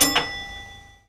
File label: buzzer, Train; OpenBVE; Cl313 Silverlink